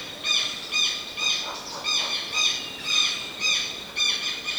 Vanellus chilensis single

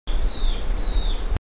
Cnemotriccus fuscatus

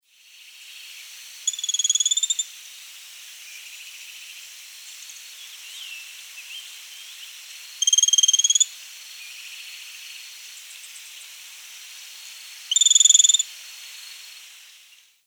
Pachyramphus castaneus

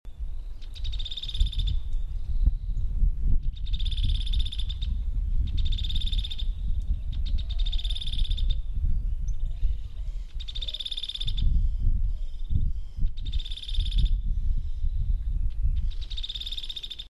Furnarius cristatus

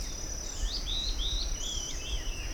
Lathrotriccus euleri

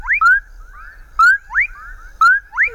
Leptodactylus fuscus

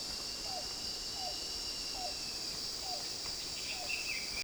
Coccyzus melacoryphus

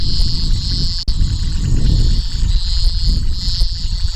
Scinax fuscomarginatus chorus

